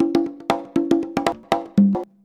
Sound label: CONGA 119, Modern Percussion Loops; USB Soundscan vol.56 - Modern Percussion Loops; AKAI